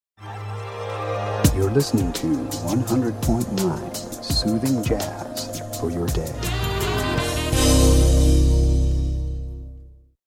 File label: Radio Station ID Jazz